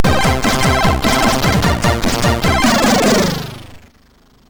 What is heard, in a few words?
sid MOS6581R2